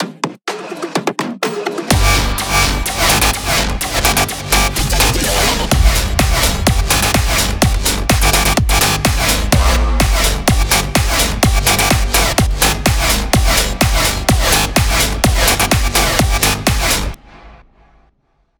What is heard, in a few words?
bass house